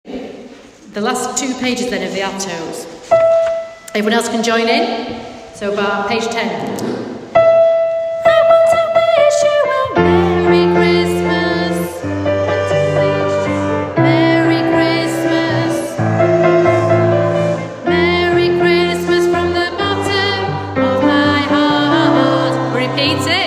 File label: alto